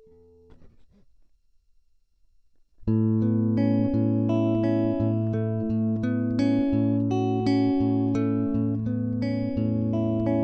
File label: New Wave Chorus